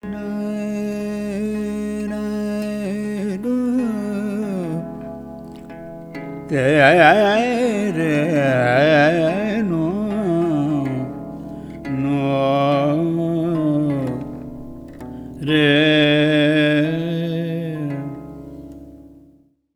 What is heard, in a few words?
Classical, Vocals Male; Sonic Foundry (Sony Creative Software); World Pop